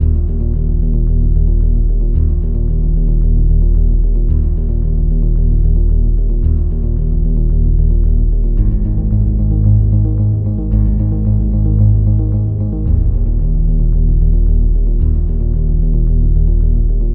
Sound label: low key loop, chiptunes